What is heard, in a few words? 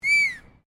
FEMALE WHISTLE MEDIUM